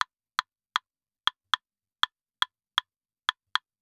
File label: CLAVES, SALSA; Best Service ProSamples vol.14; AKAI